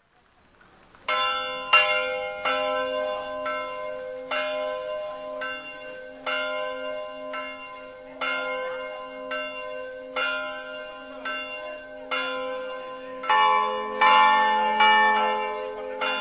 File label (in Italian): campane; leproso